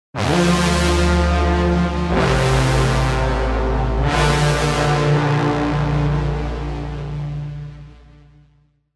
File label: Destructive Brass